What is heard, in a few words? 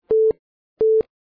interrupt